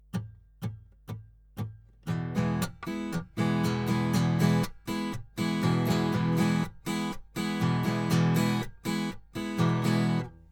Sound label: Strum 120bpm